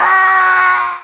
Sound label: scream; pepsiman